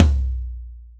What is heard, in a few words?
Tom